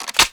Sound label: Cx4 Storm Reload